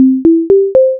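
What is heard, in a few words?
levelup, Level Up, Digital, Game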